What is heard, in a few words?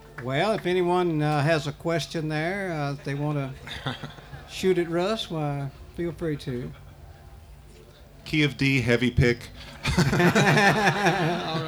guitar; Bluegrass; Winterhawk Bluegrass Festival; Winterhawk 1993; WorkShops